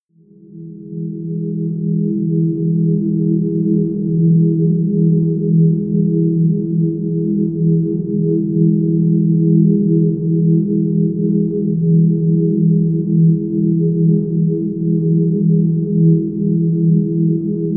drone antimatter

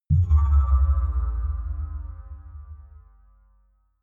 sci fi bell bass

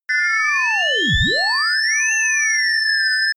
high constant modulated ringing; energie

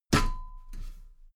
step metal ladder step top heavy

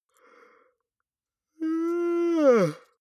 male yawn vocal high; koerpergeraeusche; menschen; soundpool

